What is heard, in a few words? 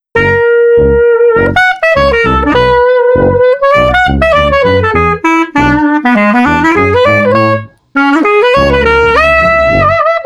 Street Clarinet Tuba 100